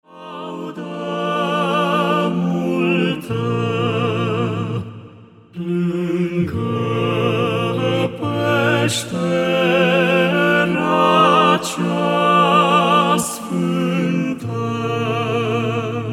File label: Voice Quartet; Mare minune; craciun